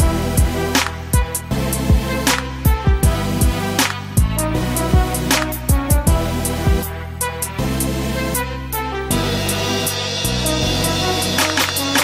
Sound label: Infinix Ringtone